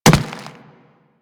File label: Infested Runner Step Default; Warframe